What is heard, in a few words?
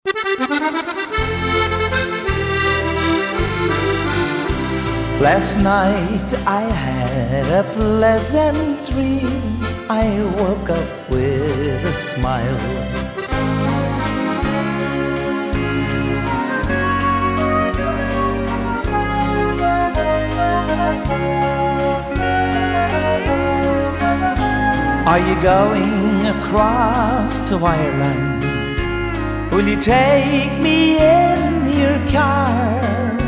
Irish Waltz